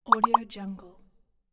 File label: ui soft pops triple